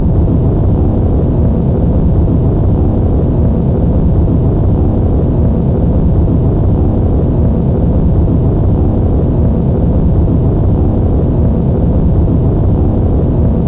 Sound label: move ship